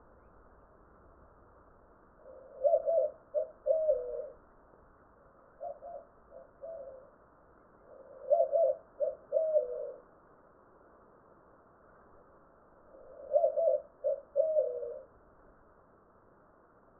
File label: Zenaida asiatica